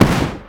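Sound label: Flak Explosion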